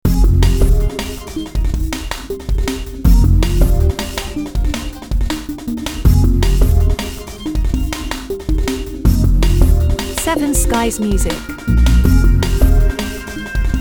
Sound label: Electro Frenzy